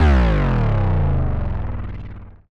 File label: Bass Drop; SoundsCrate